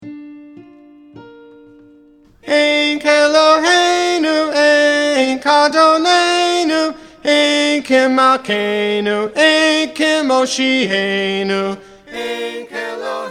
alto; ein keloheinu

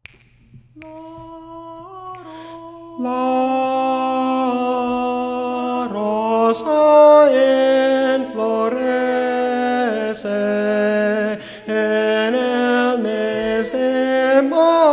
la rosa tenor both

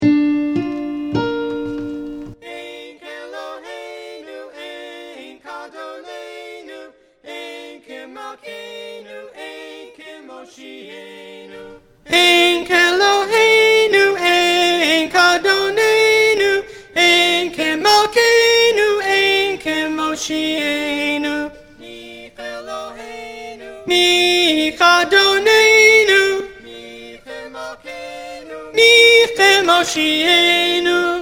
alto; ein keloheinu

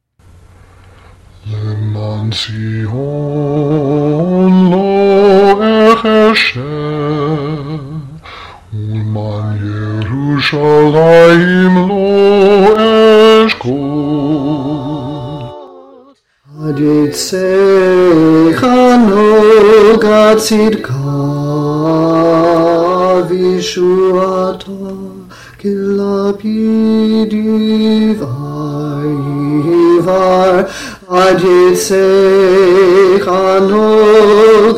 bass; lmaan tzion havkin kr version

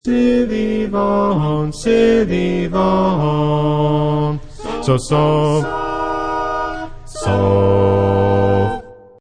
bass, chorale

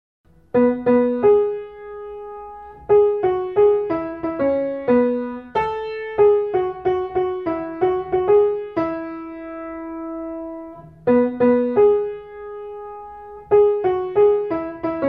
upper part piano only; siyahamba

